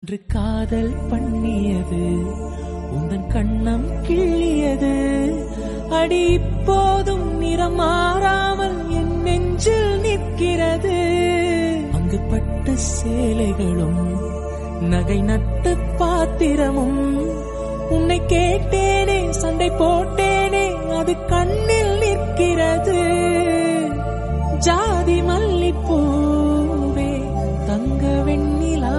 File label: Remix Song Tamil South Indian